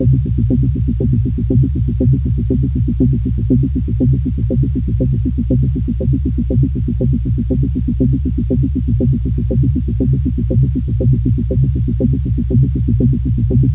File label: spin, slots